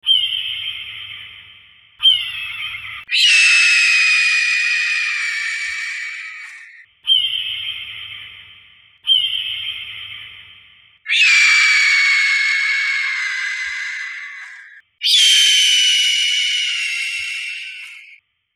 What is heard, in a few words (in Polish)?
jastrzab